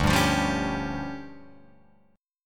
D-Minor Major 7th Double Flat 5th down Guitar Open F, DmM7bb5 chord; strummed; JGuitar